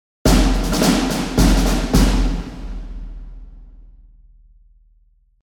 Big Cinematic snare drum

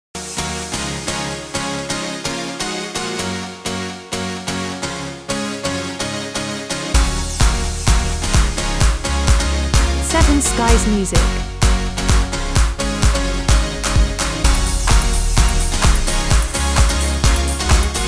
Hardcore Happy

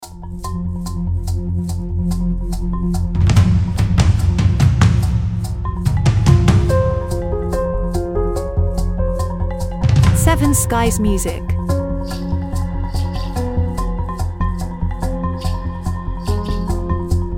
Suspense traveling theme full mix

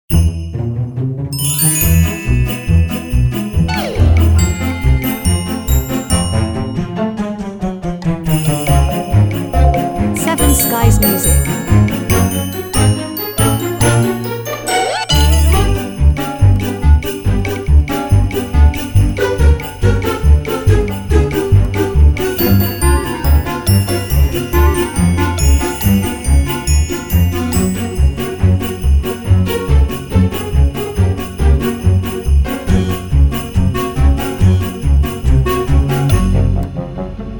Christmas slapstick comedy

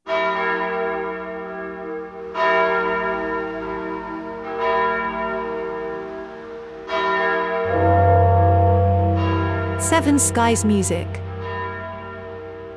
Horror TV Theme

